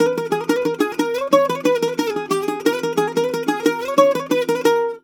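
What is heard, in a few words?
A#, bouzouki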